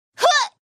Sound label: Player Dodge Bubble; The Powerpuff Girls - Mojo Mayhem; NextlevelGames